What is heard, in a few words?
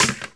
bad part stone, droids robots